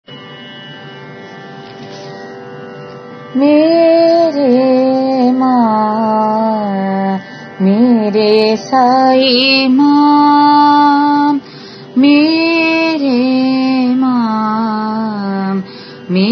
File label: Bhajan; MereMaa